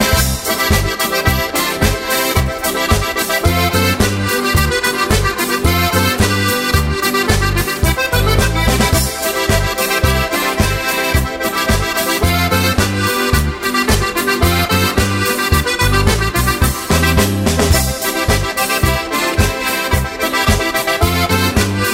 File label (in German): Madolinen Boarischer